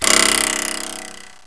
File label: TWANG; amazonia